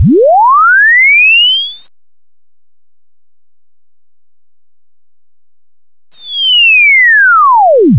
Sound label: tone 8Khz